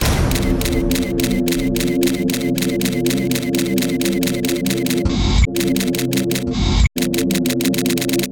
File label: Too Much Noise; Deadly Premonition